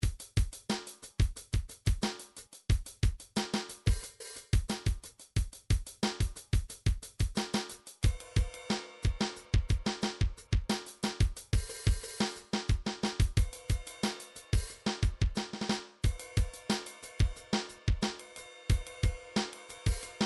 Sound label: motown; sonar